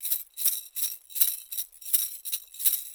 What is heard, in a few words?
Small Shaker